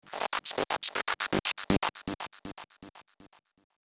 suoneria botton; beep

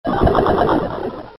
suoneria botton; beep

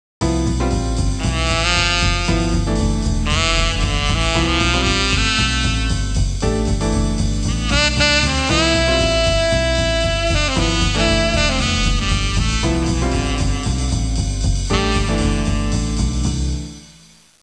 suonerie musica jazz

